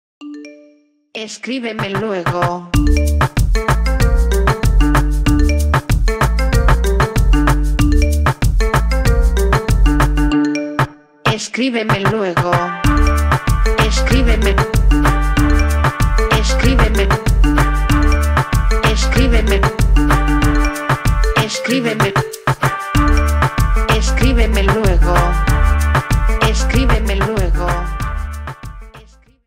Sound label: Text Tone, Iphone Trap Mix